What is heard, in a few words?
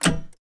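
grate button on; Through Abandoned 3: The Refuge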